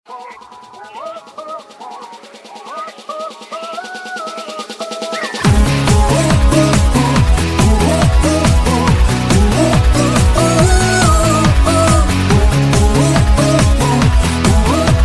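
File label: instrumental ringtone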